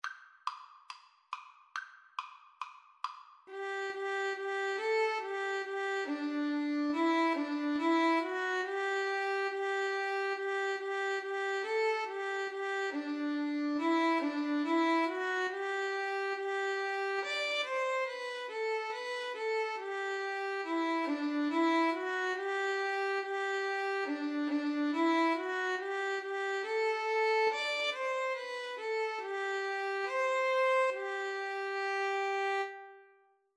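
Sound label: good king viola duet, violin cello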